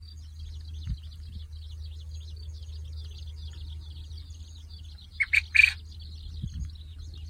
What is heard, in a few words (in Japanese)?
ウズラ